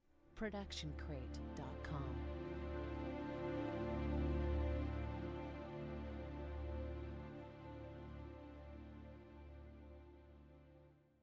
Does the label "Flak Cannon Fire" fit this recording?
No